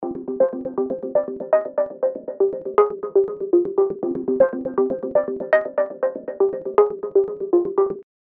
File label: trance sample